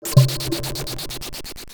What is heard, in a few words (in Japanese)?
痺れる効果音